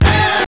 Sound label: DISCO FX; Zero-G; Funky Elements